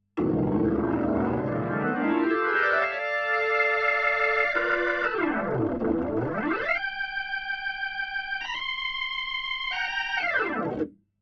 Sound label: HAMMOND